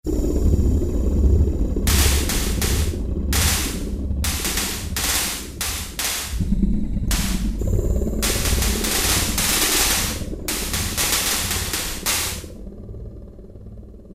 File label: Vietnam FX, Roland PN-D50; Roland PN-D50-04